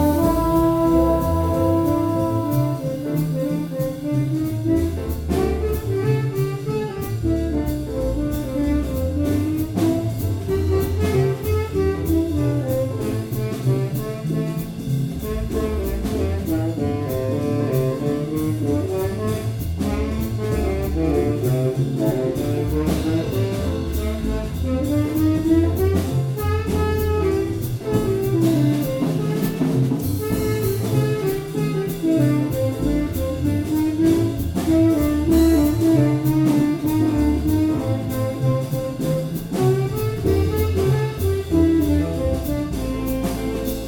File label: Solo